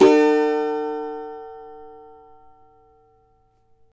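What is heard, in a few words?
E major, Guitars; Spectrasonics; Hans Zimmer Guitars VOL-2; CAVA CHORDS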